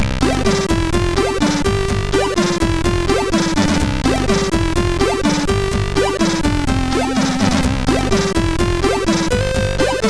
sid MOS6581R3; Heavenbound

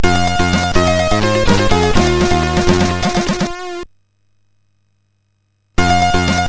sid MOS6581R4

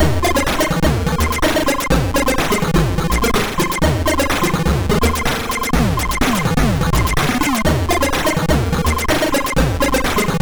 Crazy Music, MOS6581R3

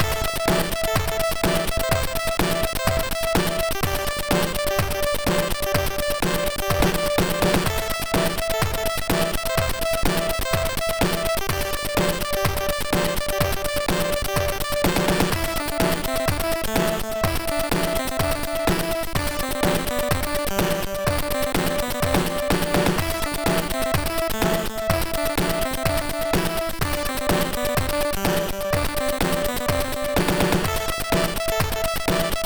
sid MOS6581R3

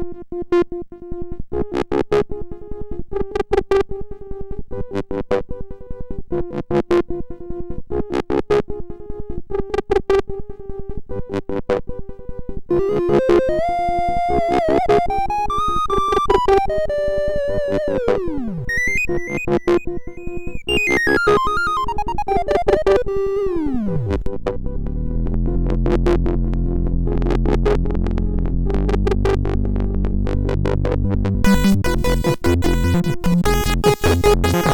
sid MOS6581R4

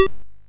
sid MOS6581R2

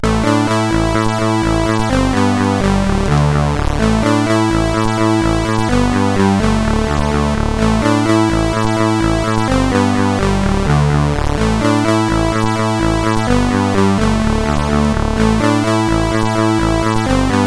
sid CSG8580R5

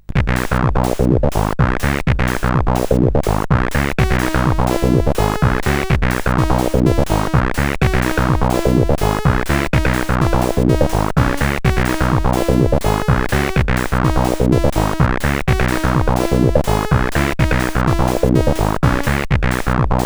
Folklor Techno, CSG8580; sid; CSG8580R5

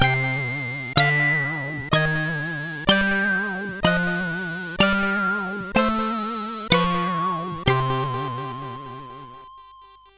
sid CSG8580R5; Alien 8

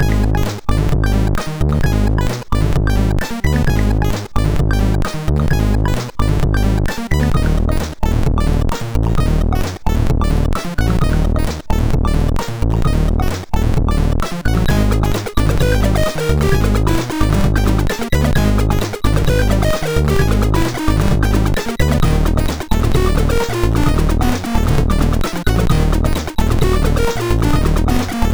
sid MOS6581R2

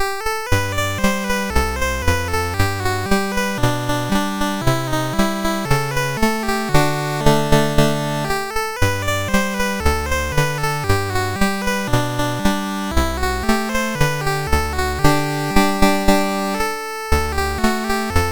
loader, MOS6581R4; Novaload

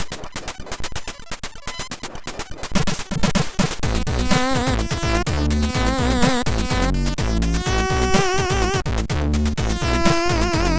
Jazz MOS6581R4; sid

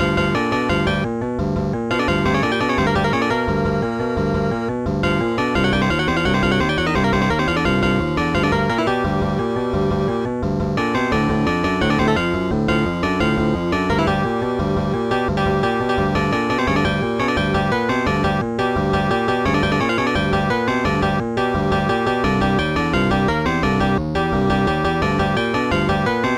blues boogie; Instant Music

